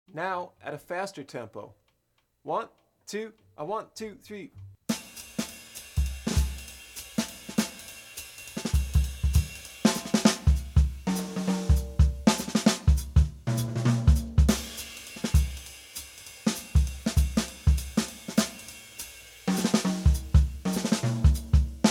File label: Orchestrating Phrases fast, Bop Drumming; The Art Of Bop Drumming; Riley